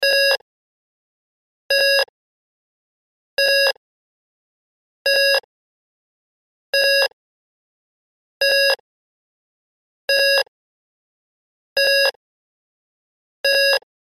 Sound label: Beeps Device Alarm, Beeps Device Alarm Signal Loop; Beeps (Sound Effects:Multimedia); Blastwave; Technology